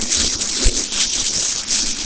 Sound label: Lightning Gun Loop